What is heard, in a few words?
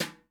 brush; Northstar - New Gold VOL-2; 30S BRUSH